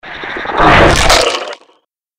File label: mirelurk attack power run; magmalurk; npc